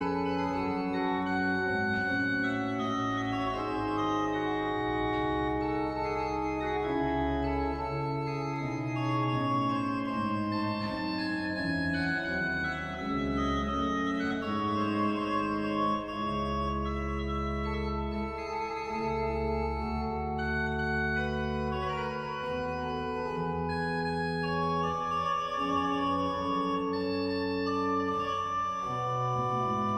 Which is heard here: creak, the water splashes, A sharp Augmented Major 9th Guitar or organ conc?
organ conc